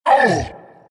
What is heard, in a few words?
abys pain; abyssal underlord